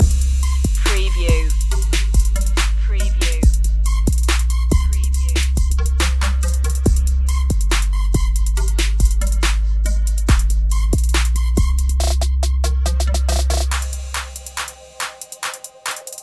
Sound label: minimal trap loop